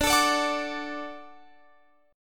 D-Major, D, Guitar, Open F; x,21,21,21,21,21; strummed; JGuitar

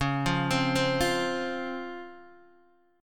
C sharp Major 7th C sharp Guitar DADGAD

